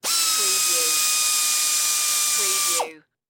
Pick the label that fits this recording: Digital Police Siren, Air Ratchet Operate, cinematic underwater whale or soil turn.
Air Ratchet Operate